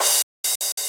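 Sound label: drum crash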